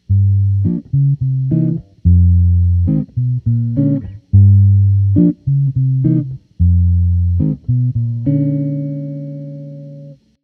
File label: dark